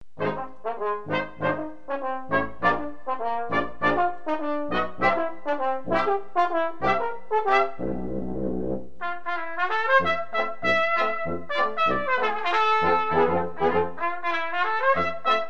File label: Dixieland Medley